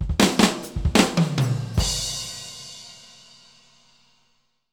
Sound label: Fills, Monster Beats; Zero-G